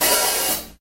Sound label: light open hat d key